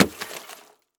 Crash Concrete Dropping On Glass And Wood Debris Short; Crashes